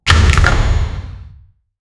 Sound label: combat